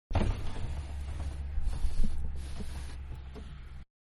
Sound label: Van-Open Window-Interior; Cars; Vehicles; Manhattan Production Music